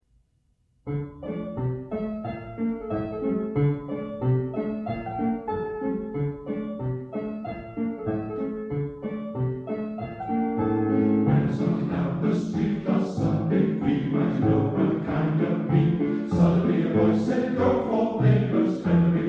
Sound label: rhythm